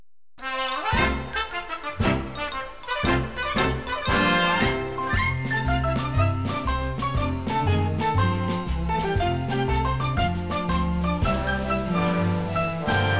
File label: whistle; 8bit